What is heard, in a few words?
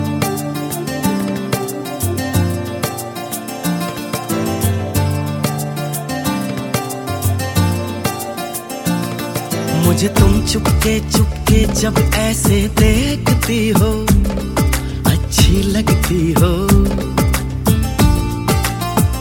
Bollywood song ringtone